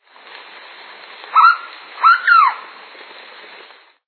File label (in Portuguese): chibante